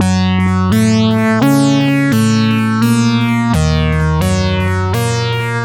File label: Synth, Trip-Hop Loops; Sonic Foundry (Sony Creative Software); Rondo Brothers